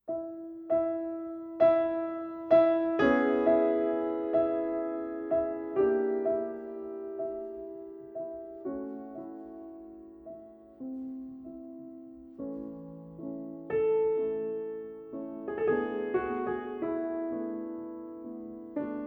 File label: String Quartet in D Major Notturno for Piano